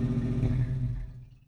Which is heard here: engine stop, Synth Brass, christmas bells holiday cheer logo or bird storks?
engine stop